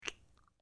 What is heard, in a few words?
Kisses Sloppy Wet, lip smack